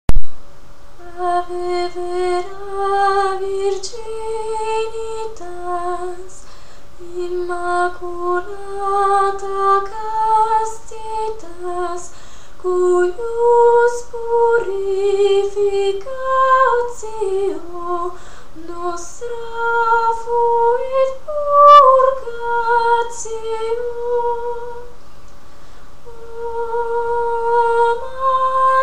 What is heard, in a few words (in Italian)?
soprani; ave vera virginitas; desprez